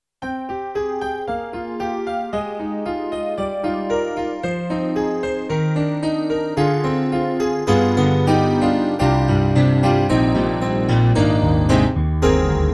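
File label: YAMAHA MOTIF-RACK ES 80s Layer, piano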